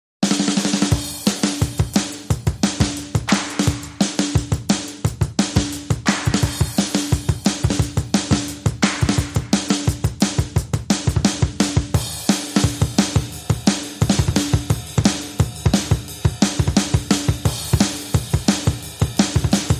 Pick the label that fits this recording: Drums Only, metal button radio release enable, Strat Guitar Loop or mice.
Drums Only